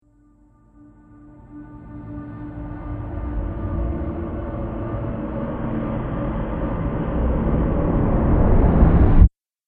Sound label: Building Atmospheric Tension Riser, scary soundscape